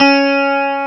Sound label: E-Guitar, STRATO